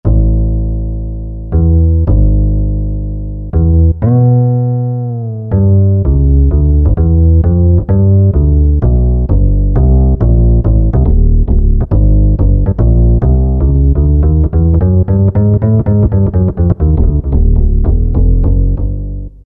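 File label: Acoustic Bass, Roland SC-50 Sound Canvas; Factory sounds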